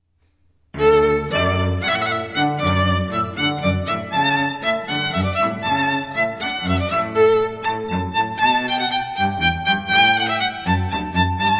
Violin Cello Hornpipe

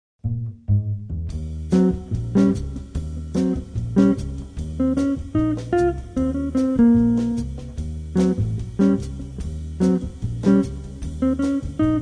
Jazz Trio

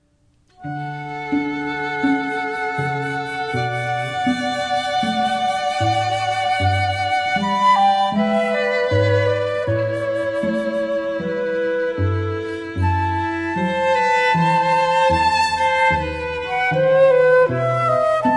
Flute Trio

